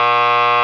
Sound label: STALL